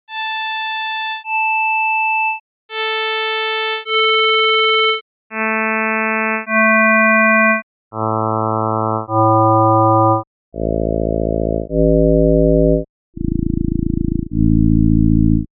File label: All vs. Odd Harmonics